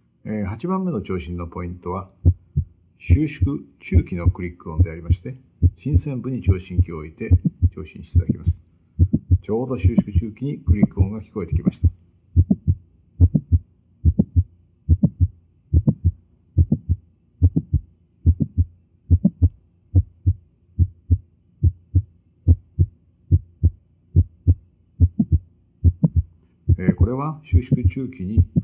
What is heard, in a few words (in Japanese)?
収縮中期クリック音